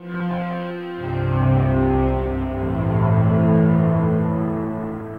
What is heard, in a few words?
Elektrik Drone, Moving; Sonic Foundry (Sony Creative Software) - Friendly Fire; DroneLoop